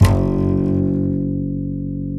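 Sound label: double bass F#2, Jazzistic; Univers Sons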